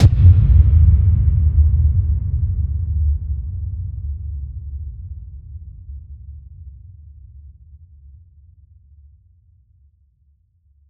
Impact; impact samples

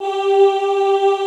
OOH G3, Classical Choir; Best Service; Extended Classical Choir

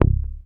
HOFNER MUTE, Bass

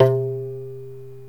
GAMBIAN BOX; GAMBIAN BX; Heart of Africa

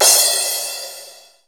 CRASH CYMBAL; East West; Global Sounds; HR16

